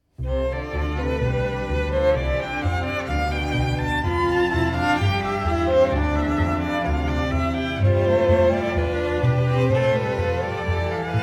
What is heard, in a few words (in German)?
streichquintett fadeout